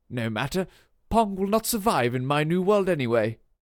Lose; Pitch Black Audio Pong

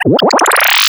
Arcade Power Up, Video Game Sounds; 1001 Sound Effects

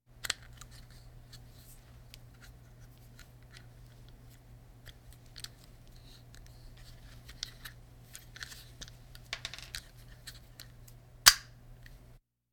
Hospital effects

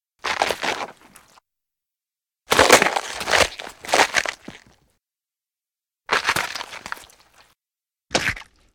chewing; RadwinIsland

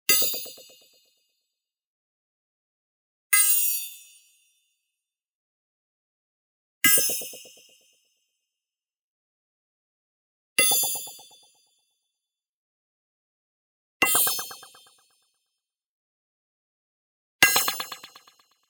BLING Ping Tonal Wobble Light; boom library; Anime Essentials

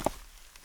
concrete; blindside

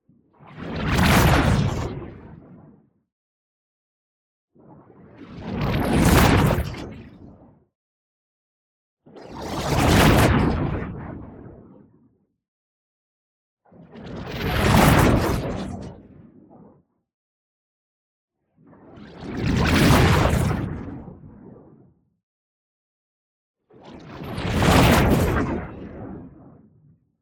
WHOOSH MEDIUM Phase Wave; boom library; Matter

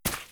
boots mud; Apotheorasis

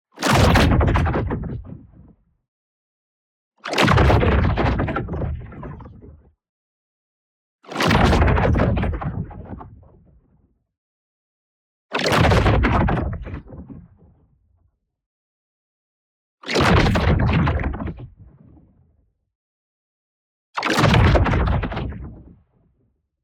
ABILITY DEACTIVATE Thunderous Force; boom library; Matter

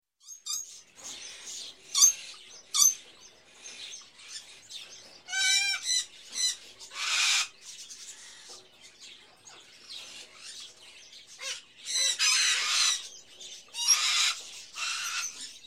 Birds, Various Birds In A Pet Shop, Ambience; Sound Ideas; Series 2000

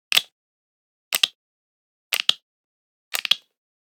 Generic Button Wood Small

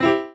PUZZLE Success Piano Three Note Fast Dry; stereo; Universal Sound FX

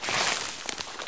grass step, footsteps; NS Studios - ultra sound collection

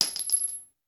Bullet Shell; Sound Ideas; Guns

